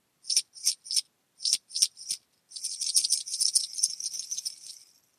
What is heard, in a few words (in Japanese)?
oukan suzu